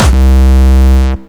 Distortion Kicks, Bassdrums

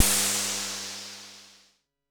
FX; MPC DSM-2

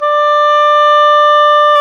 oboe, Roland Orchestral Winds

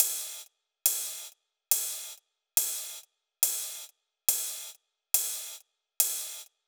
drum Loop 140BPM Ride

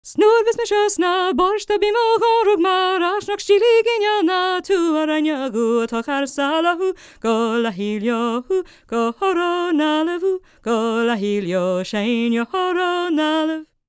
GAELIC, World Voices, GAELIC FEM

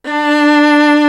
SOLO VIOLA; East West; Global Sounds

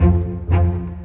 Bass Cello

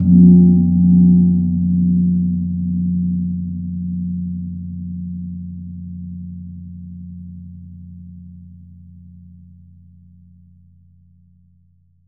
GONG F2; USB Soundscan vol.60; Asian Traditions; AKAI

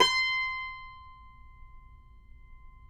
CUMBUS C, Guitars; Spectrasonics; Hans Zimmer Guitars VOL-2

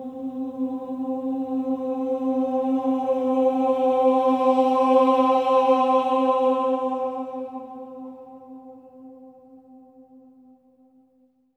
OH C4, Symphony of Voices, SWELL OHS; Spectrasonics

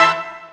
DR. LOOP; X-Static Goldmine 3; CHORDS

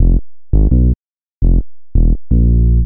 Bass, Futurist Drum & Bass

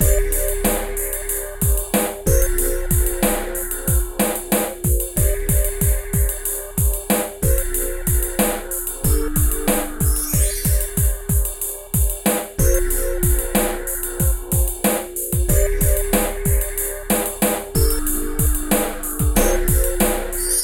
PHATT BEA

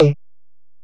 EPerc, Alesis DM-PRO

